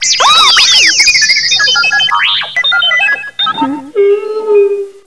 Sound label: r2d2